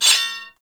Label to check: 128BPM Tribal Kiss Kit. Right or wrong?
Wrong